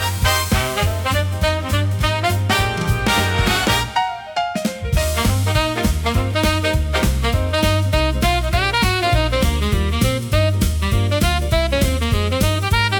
Big Brass Bounce, big band swing gorgeous showtime